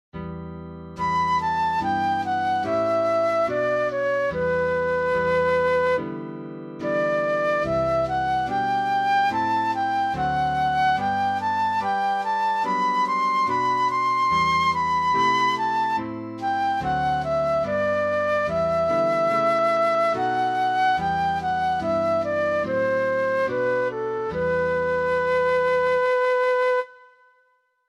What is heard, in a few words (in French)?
Psaume, 1 flute; Psaume 101 (Hebr. 102); Variation 1